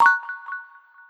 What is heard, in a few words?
beep lo